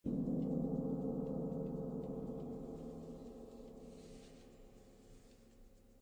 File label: Gong Hypno